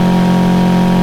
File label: Lamborghini Aventador High, car; gamerip; Car Simulator 2; android